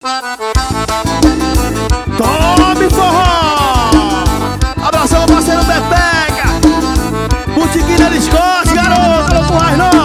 forró; promocional de setembro 2014

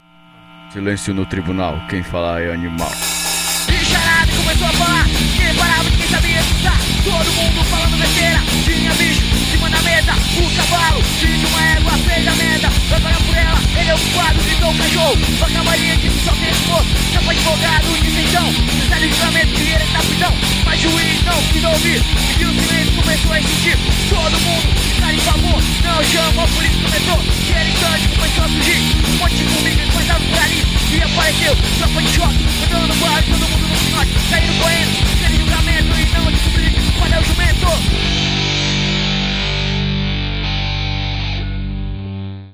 rock silencio no tribunal

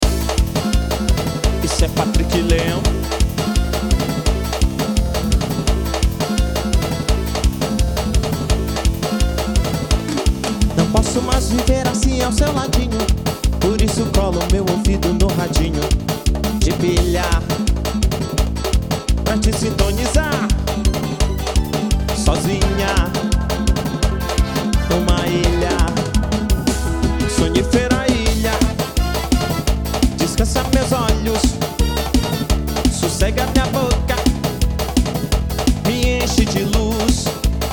rock folia

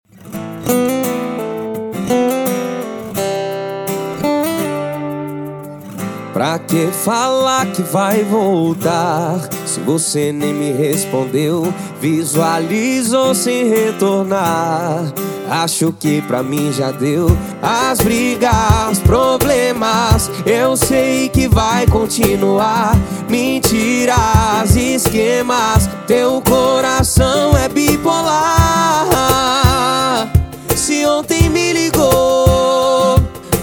sertanejo universitario

